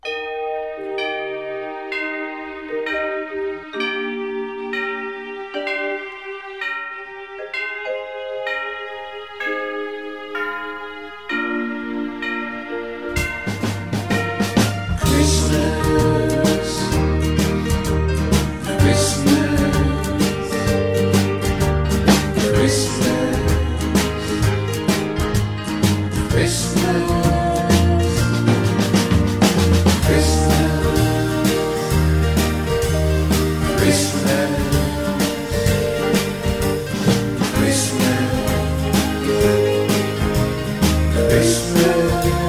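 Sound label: Instrumental with background vocals with Harmony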